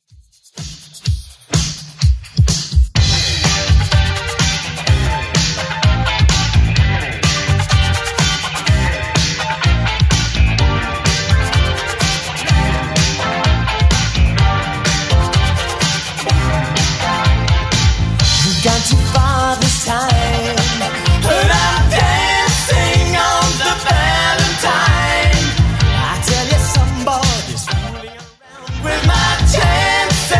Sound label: 80s dance redrum 126 bpm